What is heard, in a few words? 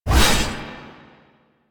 Blade Swipe; Melee; Dagath